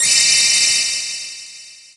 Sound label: Transform Begin; Transforming into Sheik; Zelda